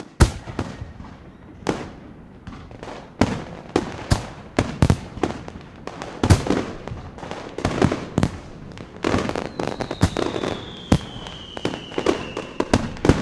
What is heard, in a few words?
fireworks